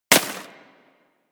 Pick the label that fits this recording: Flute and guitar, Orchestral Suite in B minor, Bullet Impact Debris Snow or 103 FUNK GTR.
Bullet Impact Debris Snow